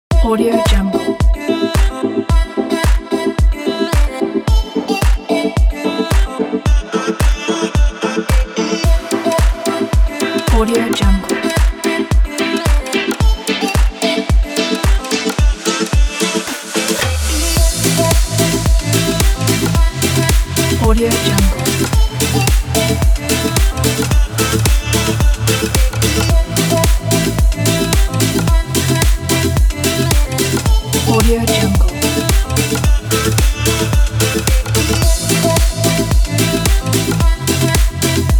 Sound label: positive background music